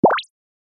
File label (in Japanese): ぴょこ